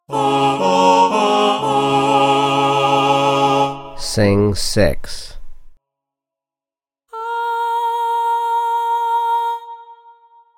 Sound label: C# minor6 Voice Alto; contextual ear training voice edition